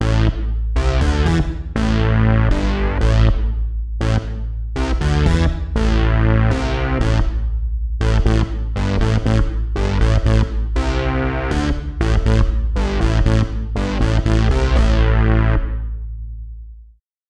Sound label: bass oscillating resonance